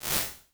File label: Reversed B, impulse responses, gated reverbs; Small Spaces; Apple